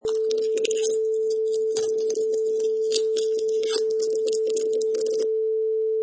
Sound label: Egg Hatching